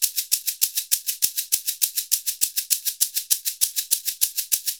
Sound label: SHK, Shaker; Univers Sons; Basicussions